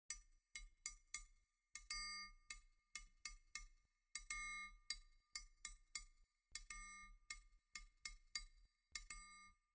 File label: Triangle; Real